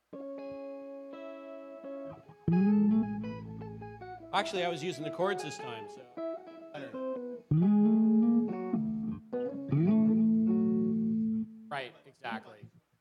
Vocals

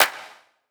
clap one shot, Pop drums; royal; Slate; SD PASADENA Pop Sample Pack

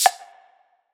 percussion oneshot found; Pop; Drums; SD PASADENA Pop Sample Pack; Slate

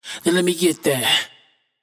hook Lemme Get That wet, Vocal; Slate Digital Omnivox Vocal Sample Pack

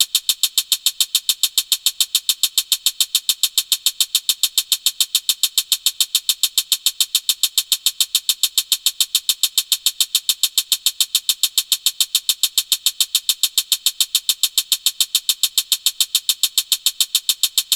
hat 105, Hat Loops; Drum Loops; SD MIDNIGHT Sample Pack; Slate

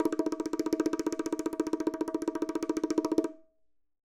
bongos Roll Low Drum Right; raw perc one shots; SEISMIC Drum Sample Pack; Slate

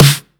909 Snare; Slate; Vintage Drum Machines Volume 3 909 Edition

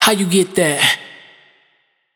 hook How You Get That wet, Vocal; Slate Digital Omnivox Vocal Sample Pack

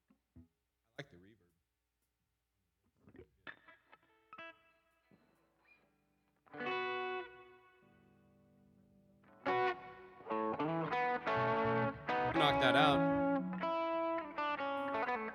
Vocal Emphasis Mix

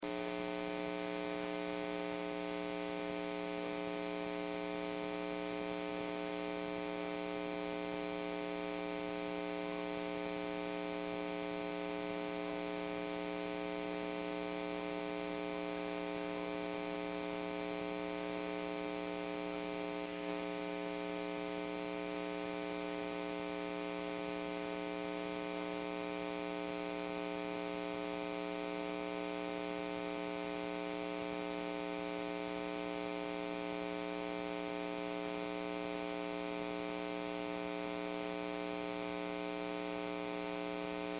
Background Mic Mix

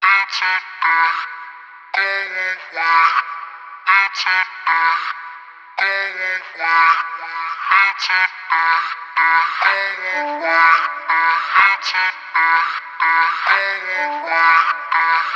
vocal chop, 125, Em; GoAway; Slate Digital Omnivox Vocal Sample Pack; Chops

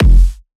kick pluto; Drum Sample Pack; One Shots; Slate; SEISMIC

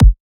kick heart, Drum One Shots; SD MIDNIGHT Sample Pack; Slate

